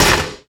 radio impact lg, metal; physics

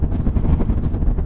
teleport rings loop; Half Life Model Pack; Half Life 2; ambient levels

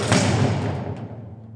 elev, ambient tones

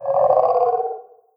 waiting call, headcrab reviver; creatures; vox

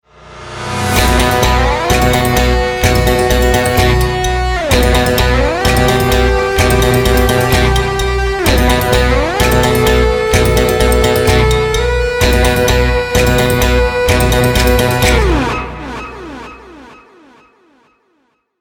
start action, music

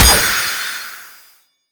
gastank fire start; combine soldier

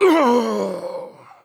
death; Counter Strike Model Pack; CS:GO; phoenix coop

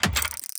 securitydoor payment start; Counter Strike Model Pack; CS:GO; survival

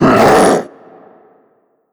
alert, zombie vox; creatures

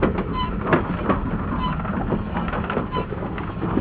water wheel; Counter Strike Model Pack; CS:GO; inferno

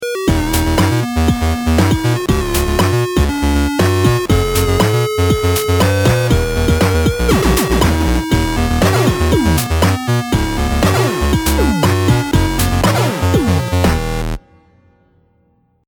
start action

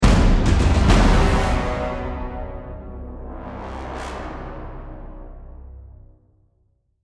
deathcam; music

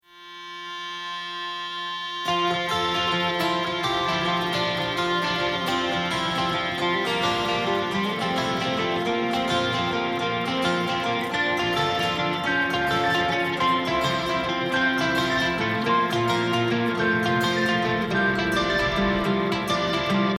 start round, music

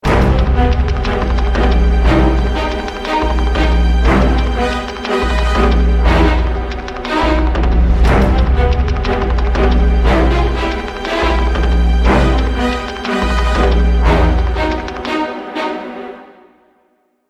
start round, music

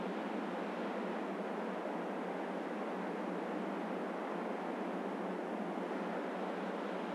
dust wind

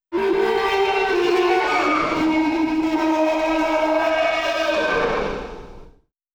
subject yell; events; Portal 2

